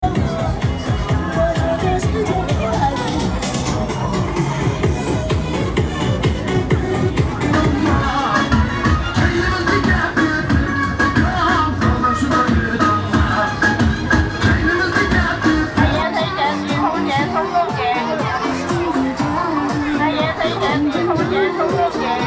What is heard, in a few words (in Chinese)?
音乐